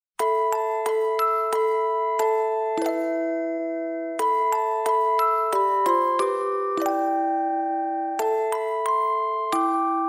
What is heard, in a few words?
mysterious orgel correct